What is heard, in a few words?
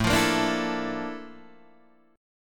Bb Major Sharp 11th A, chord